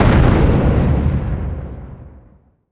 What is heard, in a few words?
ship hit big effect; Hearts of Iron IV Death or Dishonor